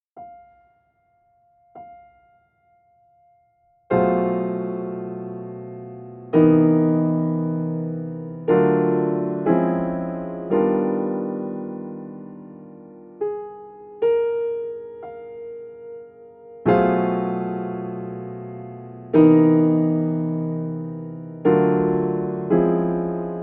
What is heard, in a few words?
polychord